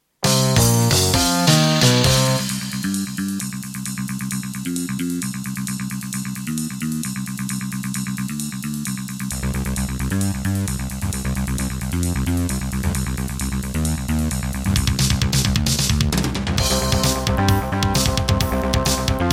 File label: Pine PT-2030 Vivaldi Xtra Wavetable DB; sigil doom episode 5